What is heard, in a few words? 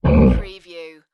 Monster Grunt Short